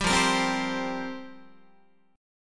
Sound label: F Suspended 2nd Suspended 4th F down Guitar; strummed; JGuitar